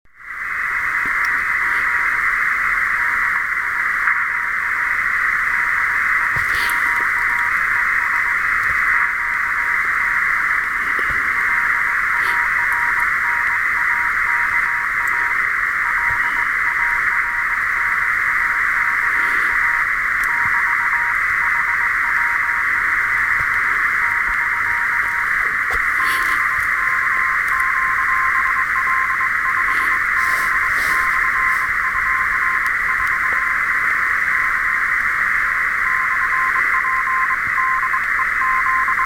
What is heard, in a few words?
RX